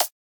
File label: buttons; Jungle Treasure